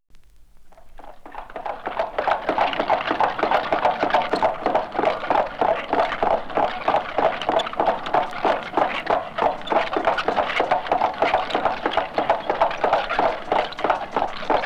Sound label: Horse And Carriage